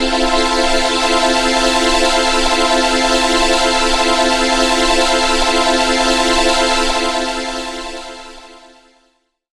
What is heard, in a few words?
Soul, Pads; Jungle Warfare 1&2